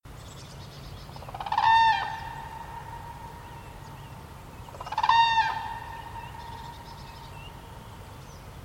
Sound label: birdwatch quiz